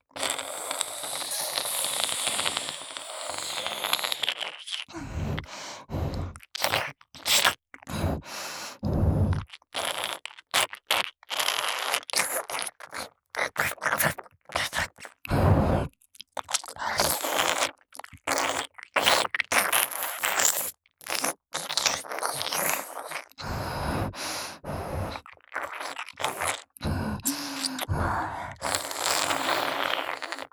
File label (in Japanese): 安眠用 耳吸い 左